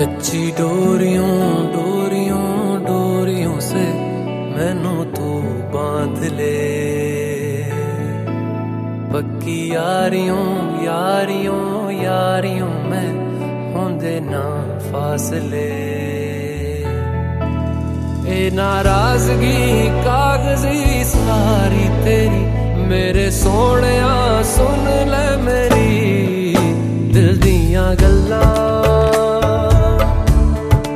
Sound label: Punjabi Ringtone